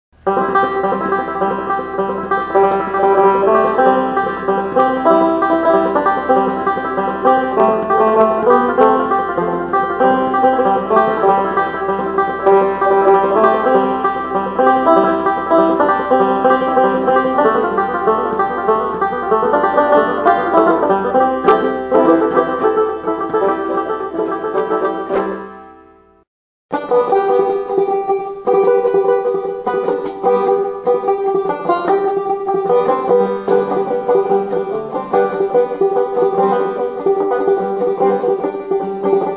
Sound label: banjo test